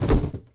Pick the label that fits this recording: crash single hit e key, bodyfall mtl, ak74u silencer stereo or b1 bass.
bodyfall mtl